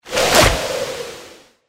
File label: mega win paper; Hot Off The Press